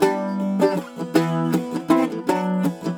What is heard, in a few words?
Celtic Flavours; USB Soundscan vol.42; AKAI